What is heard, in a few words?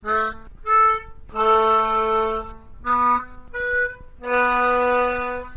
Octaves, diatonic